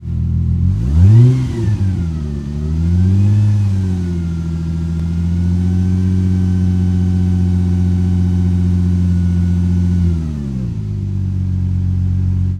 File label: Prowler Fast Idle, Automobiles